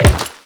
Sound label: Step Metal, Footsteps; Warframe; The New War; Characters; Kahl